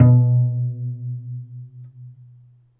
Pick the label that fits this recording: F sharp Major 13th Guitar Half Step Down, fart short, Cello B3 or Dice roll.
Cello B3